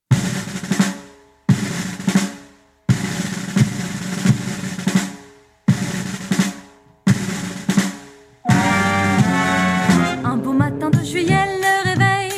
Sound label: brass band